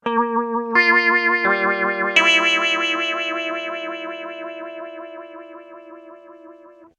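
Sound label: clean guitar pigtronix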